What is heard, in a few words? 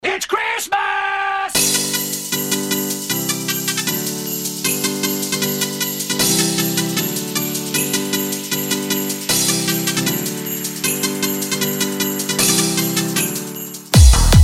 hardbass christmas